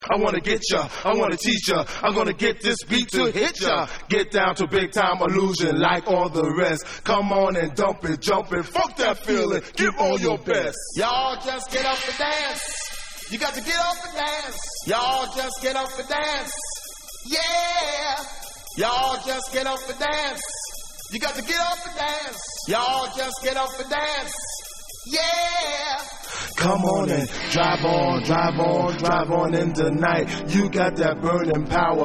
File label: Acappella Mix